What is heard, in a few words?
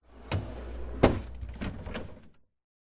freezer internal sliding tray compartment plastic slide